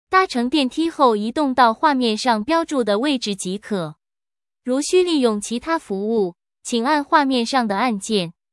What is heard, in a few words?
move elevator arrive